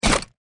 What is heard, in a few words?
rail charge attach